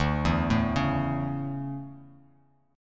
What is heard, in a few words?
D Major 7th Suspended 2nd, Bass Standard; arpeggiated; JGuitar